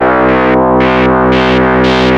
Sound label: ARP Odyssey